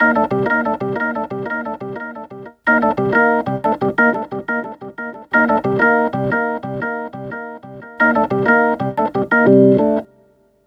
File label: Organ Lick